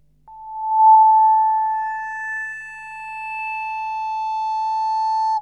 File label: Guitar Textures